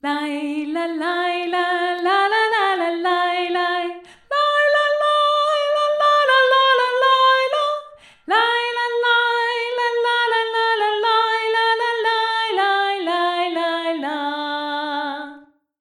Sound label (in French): Soprano VOIX; (Rép. tchèque)